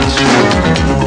dancers bass; running-man

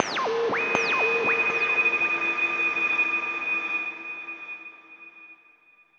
AMBNT 160; Ambient

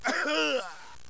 GRUNT

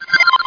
Jangle Beep; Short Circuit v1.0

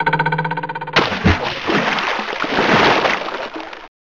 Music; breaking bricks

